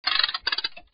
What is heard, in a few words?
crank